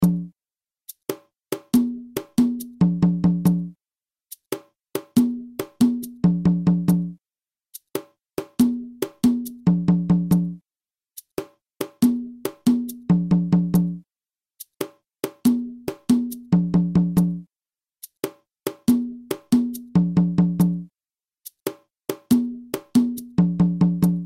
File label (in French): guarapachangueo cajon lent